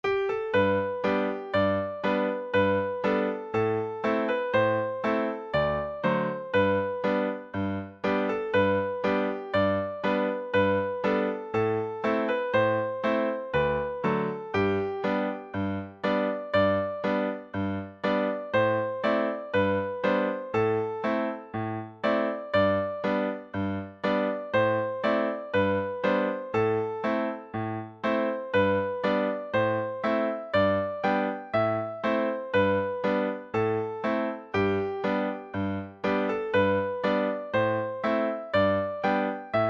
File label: sweet chiming bells, session tune